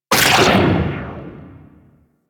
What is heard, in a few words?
Railgun Charged Mech; Weapons; Warframe; Archwing